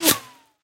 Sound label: miss, guns; Weapons